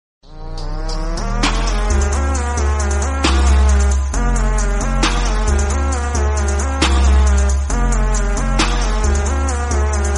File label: instrumental, bgm; vedalam